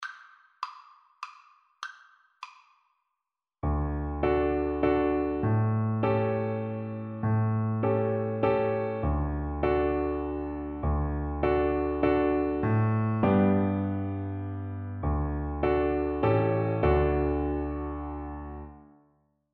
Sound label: happy birthday, Double Bass